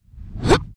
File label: swordman attack; megaglest